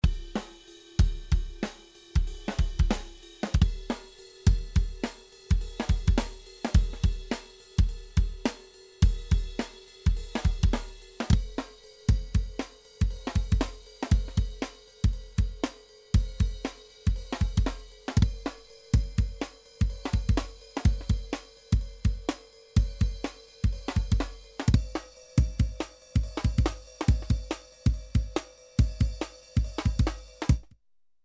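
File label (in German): Emax 10 kHz Drum loop Transponieren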